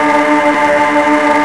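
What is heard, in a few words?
fast loop, engine; ships; Quantum Redshift; XBOX; kumiko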